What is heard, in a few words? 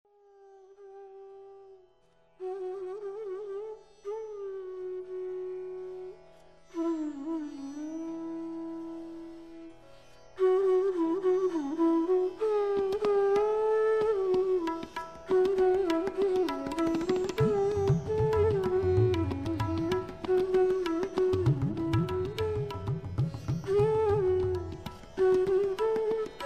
abhogi